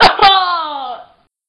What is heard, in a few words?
death; quake2; austavin